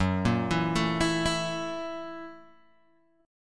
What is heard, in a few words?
F sharp 7th Sharp 9th, Guitar, Open G